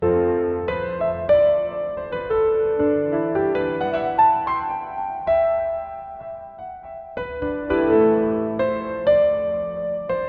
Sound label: sad piano music with slow; instruments